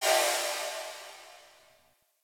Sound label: Dist Steam Burst; Warframe; Venus Open World; Venus Solaris United